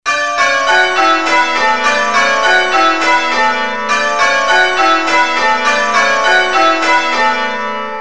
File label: Hagdyke, changeringing